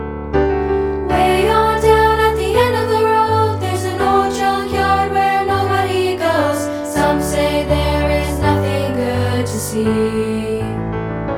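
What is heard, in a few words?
piano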